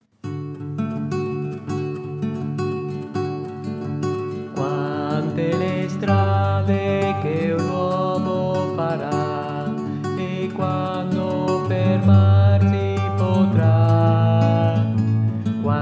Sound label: bass